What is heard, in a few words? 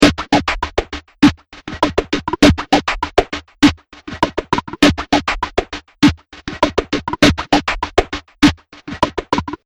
Drum Loop 100 Bpm Uneasy Beat; Royalty Free Drum Loops; Percussive And Rhythmic; Seamless loop; Andrew Fleming